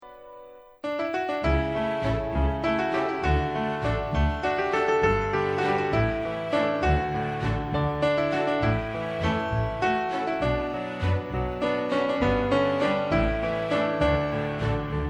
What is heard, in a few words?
vals